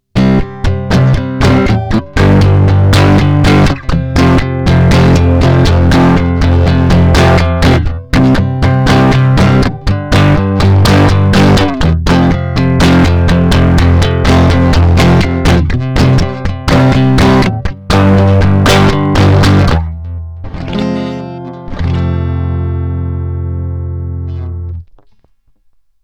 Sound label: Strat DI; Neve 88M; Clip